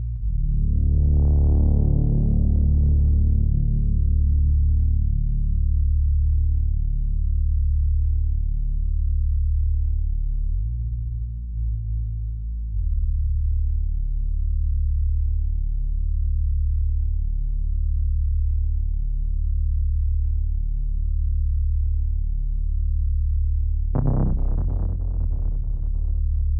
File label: Caves Amb Pads; Dynamic Music